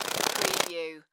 Insect Wings Shake; Sound Effects Bible

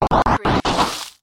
Scifi Production Element - Stutter Distort

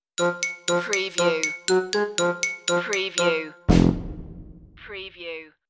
ducky stinger

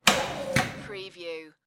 Electric Switch

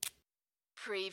highlighter pen open

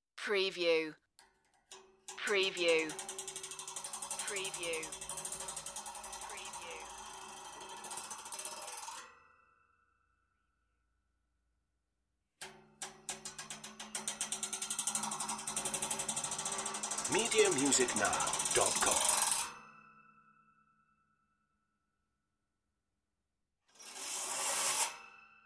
grid slide

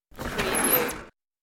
Door Slide

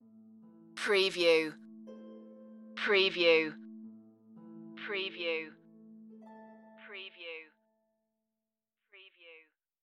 the death of a hero stinger

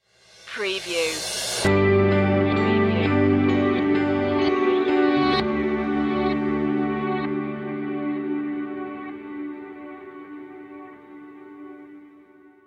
drifting stinger

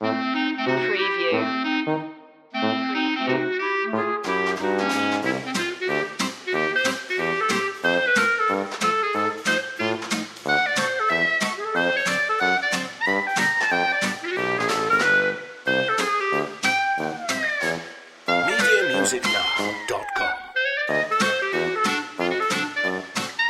dewdropper less clarinets

